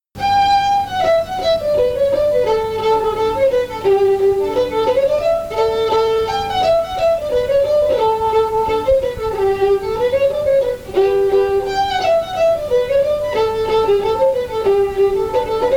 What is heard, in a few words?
hornpipe, fiddle; stack of barley